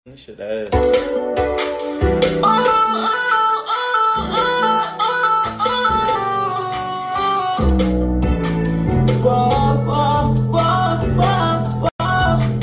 ouuu ouuu

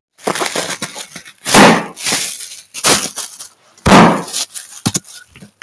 bomb

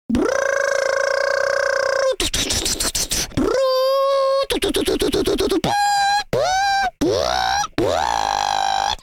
machine noises

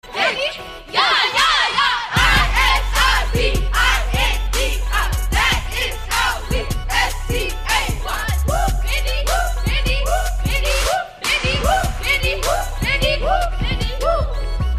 cheer

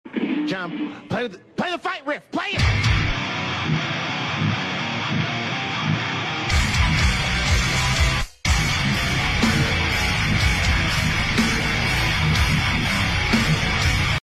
fight riff

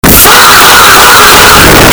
fah extreme

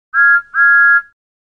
thomas whistle

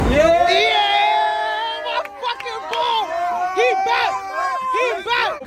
yeaaa

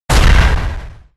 crawling; troll

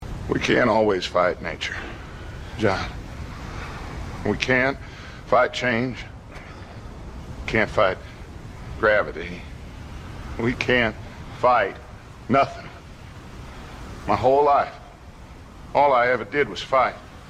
death; dutch

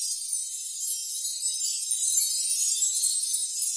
chimes

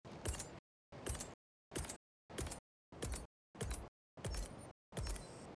stratagem input; helldivers 2

